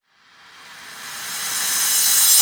Reverse Cymbal; Vengeance Samples; VEH1 Cymbals

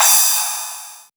Crash, Cymbals; Vengeance Samples

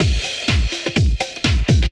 drum loop 125, vocal house; PS-28 Vocal House Samples; drumloops

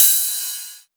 Ride, Cymbals; Vengeance Samples

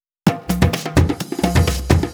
Fill 128 BPM; Vengeance Samples; VEH1 Loops

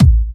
Soft Kicks; Vengeance Samples

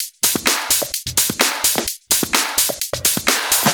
Houseloops 128BPM; Vengeance Samples

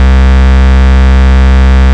Ultimate Bass Root C; Vengeance Samples

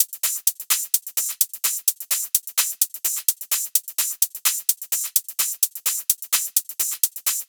Entourage Drums 2, 128BPM; Vengeance Samples; Entourage Kit

